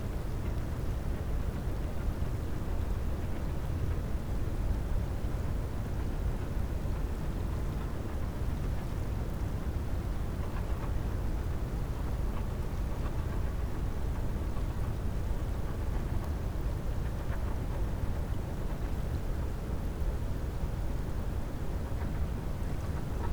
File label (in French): cachalot negatif, Physeter Macrocephalus